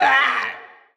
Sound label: Devil Die